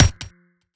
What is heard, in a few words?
chain Gun Shot; Weapons